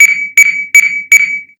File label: beat warn faster